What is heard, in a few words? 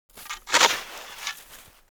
Shovel Snow